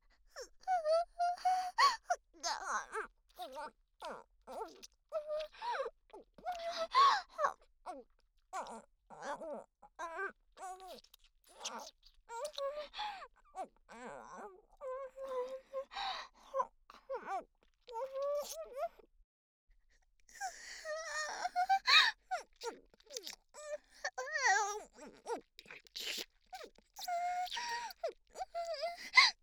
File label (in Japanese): イラマチオ・恐怖に泣きながら口に咥えている声; ストレートお姉さん系カノジョ